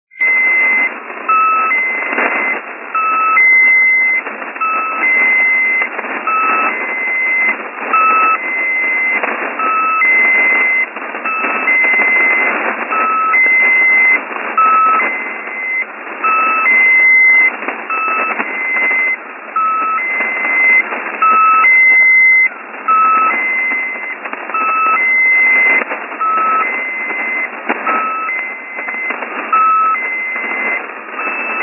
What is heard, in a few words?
usb marker; 3791 usb